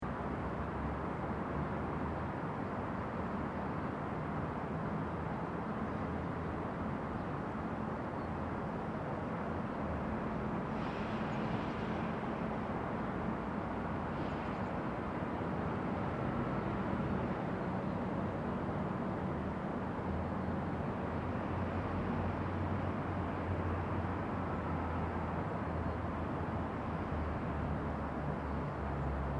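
Distant City, Distant city ambience. Use as city wash outside apartment window etc.; Distant city traffic; City Sounds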